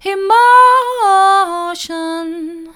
emotion mid, vocal

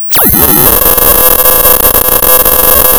Sound of tearing paper, center

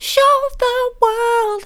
soft, vocal; show the world

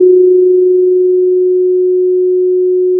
pop, Sound of a phone ringing

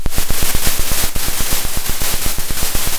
Sound of a drum roll

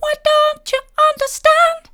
why don't you understand mid, vocal

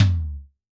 Tom, drum

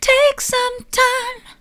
take some time soft, vocal

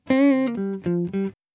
cool guitar riff

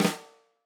Tam Flam, drum

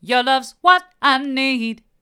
hard, vocal

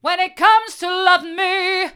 hard, vocal

